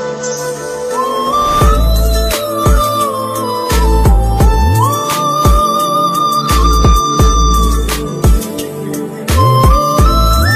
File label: Emo Trap Mix; Minnalae